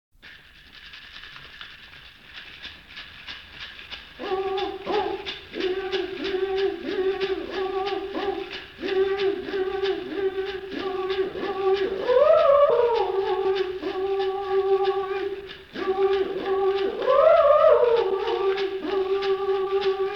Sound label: Navajo Night Chant; Voyager Golden Record